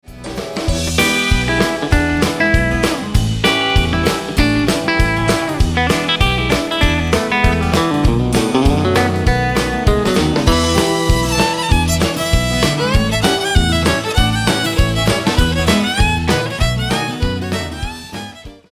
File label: DD3 Hi Cut